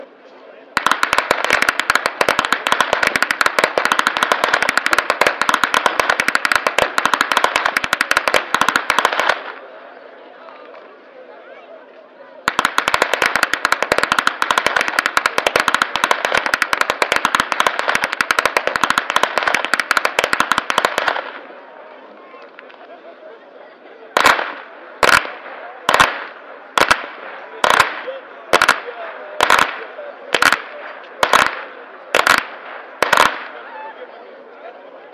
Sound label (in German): schnalzen, flachgau